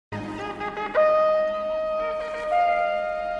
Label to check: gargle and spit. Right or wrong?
Wrong